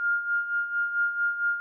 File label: tuning fork; Hollow Knight